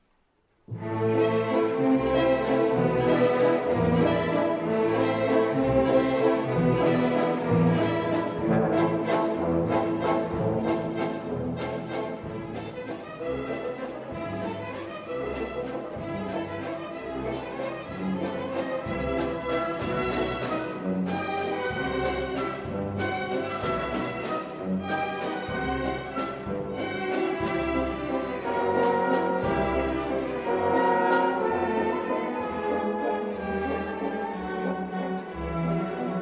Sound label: Maskerade Walzer; Chatschaturjan